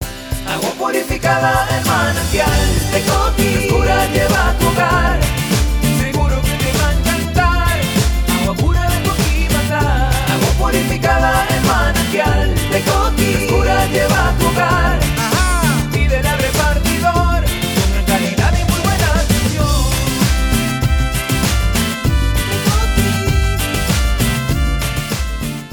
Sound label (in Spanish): Jingle; el Manantial de Coqui